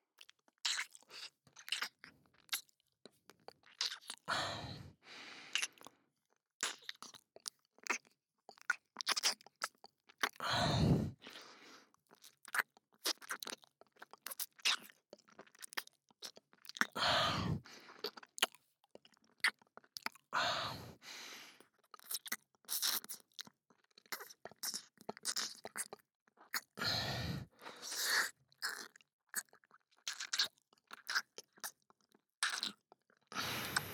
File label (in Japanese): 耳舐め音声